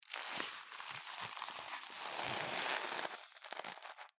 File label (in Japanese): 革の手袋の音